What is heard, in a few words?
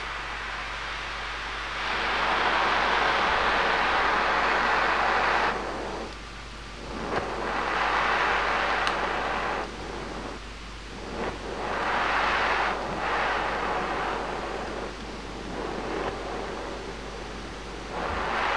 Torch